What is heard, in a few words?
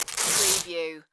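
Masking Tape Rip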